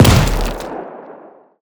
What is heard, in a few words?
soundwave weapon scifi explosion debris; Bluezone Corporation; Transformers